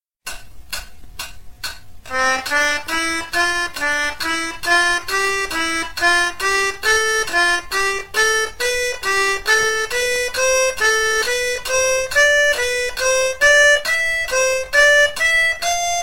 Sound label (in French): gamme do pousse tire